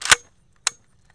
50 CAL, Deadly3D; E-MU Producer Series Vol. 5 – 3-D Audio Collection; 3D Percussives

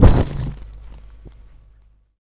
WHOOSH; Spectrasonic Distorted Reality 2; LO-TEK 2

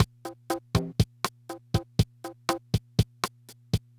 Loop, dd-5

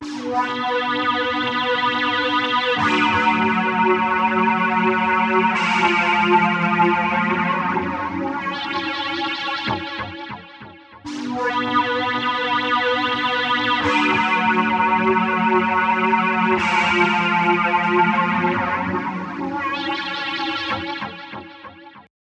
Melodics; neuro; Optiv & BTK

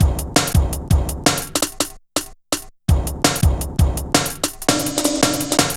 Bouncy, Jungle, Drum Loops 166.5

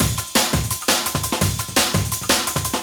Get Down Break, breakcore breaks

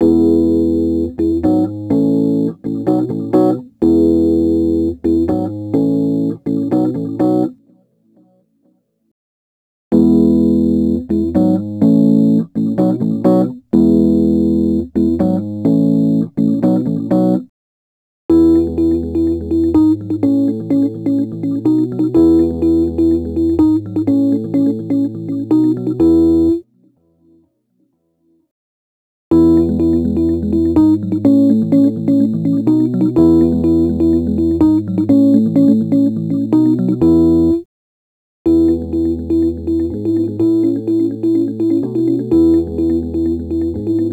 Rhodes Attacks; Ueberschall

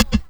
RIM PROCE, RIMS, Fresh Disco House; USB Soundscan vol.20; AKAI

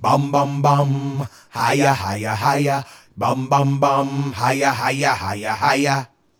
ELECTRO HIP-HOP; Bass; Sonic Foundry (Sony Creative Software); Not Just Another Pretty Bass

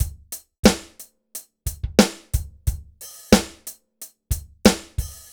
BPM 90 DRY

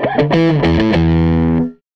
SG Guitar Loop; Sonic Foundry (Sony Creative Software); Six-String Orchestra

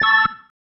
Stab, Organ, One Shots

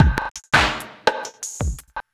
Weird Wood, Synchro Funk, Beats; Sonic Foundry (Sony Creative Software)

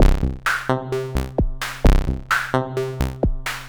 MODU C, Modular Synth Loops

